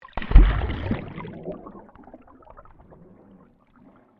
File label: Underwater Splash Impact Medium, Submerged impacts created by dropping a weighted rope into